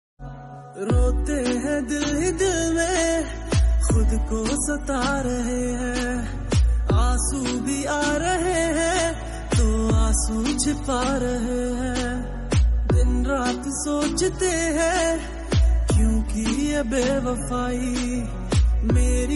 Sad Song

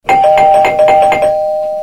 doorbell

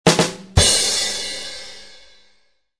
badum tss

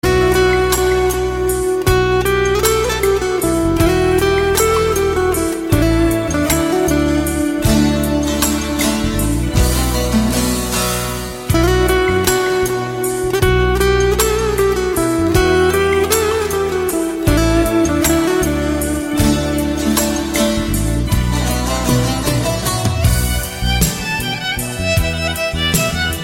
guitar turkish

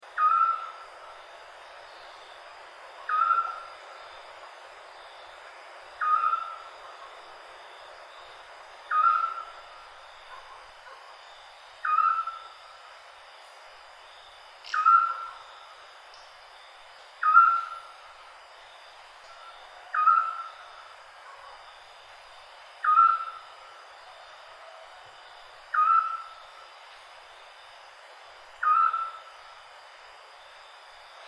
night bird calls

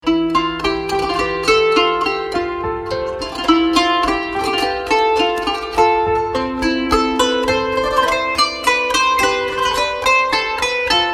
kanun ringtone

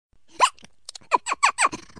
squirrel

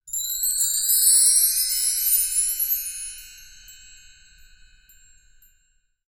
chimes sound effect

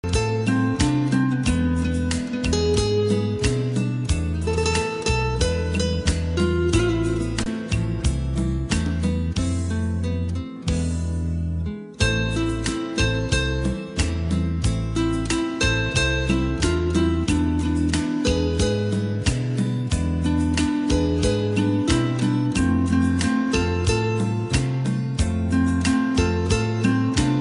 romantic love story guitar ringtone

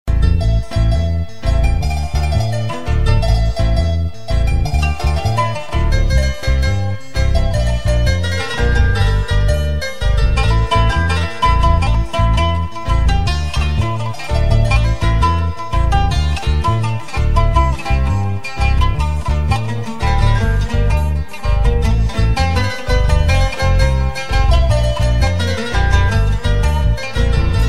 Guitar arabic ringtones arabic guitar

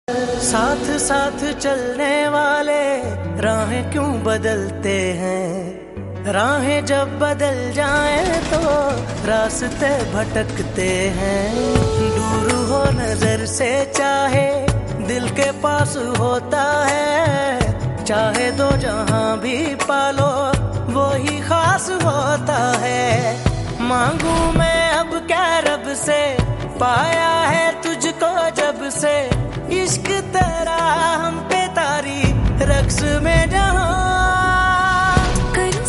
Hindi Song

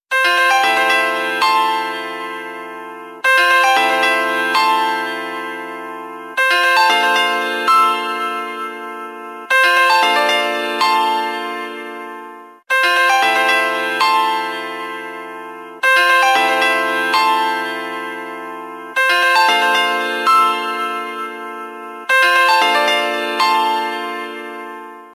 LG Optimus 2X bloom

